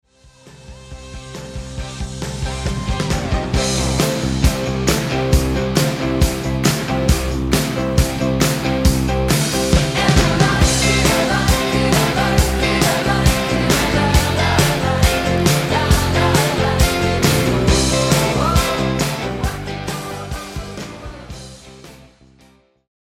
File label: Rockabilly